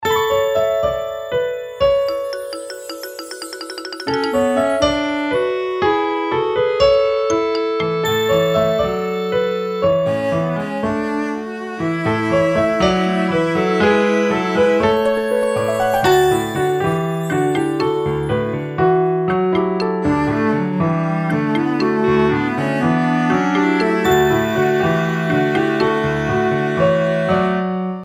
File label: Honk music